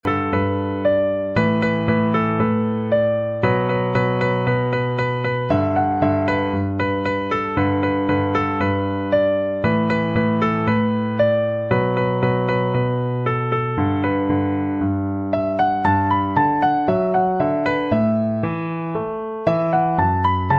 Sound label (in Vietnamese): Day Bien Piano